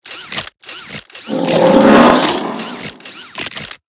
bear robot; rise of the robots

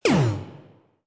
ray gun fire tap

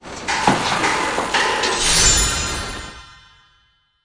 factory; rise of the robots; mr sparkle factory

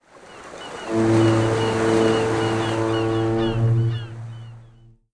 abandoned lighthouse

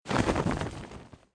toilet paper tree tap

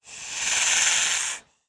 snake hiss

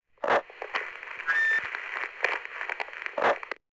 sfx desert public phone; summer of our discontent; the summer of our discontent act 3 prizes

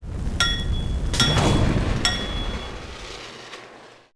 desert welding yard; summer of our discontent; discontent gil deal desert welding yard

